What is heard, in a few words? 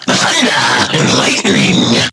game over